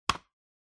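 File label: Trackpad Click Right Hard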